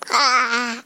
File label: wawaeaa